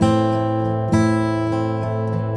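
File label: A minor, acoustic guitar 100 BPM; Best Service; World Colours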